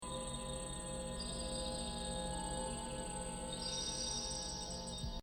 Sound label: Distant piano tinkle